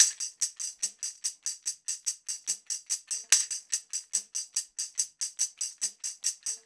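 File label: TAMB, tambourine; Drumdrops In Dub VOL-1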